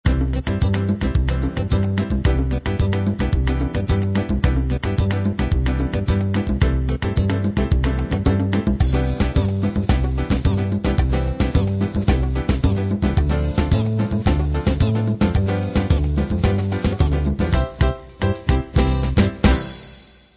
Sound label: fast bossa